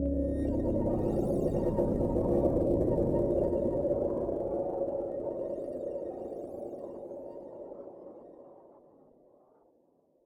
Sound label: Explore Start Synth Stem; Necropolis